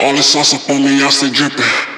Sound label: phrase, Vocal; Slate Digital Omnivox Vocal Sample Pack